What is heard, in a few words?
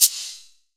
Perc, Gated Reverb; musicradar